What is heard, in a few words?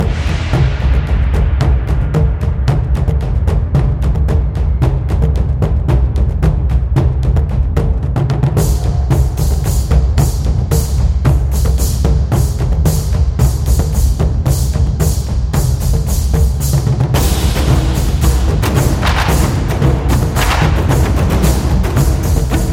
Japanese Drums Traditional